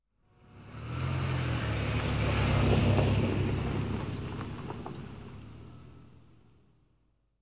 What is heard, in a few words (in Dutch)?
Auto Passeert langzaam; KMP Film Video Sound Effects